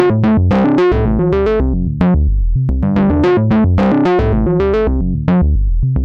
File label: fm mbira-ish 128bpm c just tuned, synth thumb piano; vcvrack